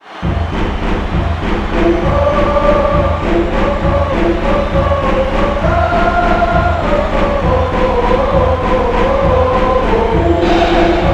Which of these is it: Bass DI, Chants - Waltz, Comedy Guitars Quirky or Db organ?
Chants - Waltz